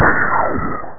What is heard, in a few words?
missile low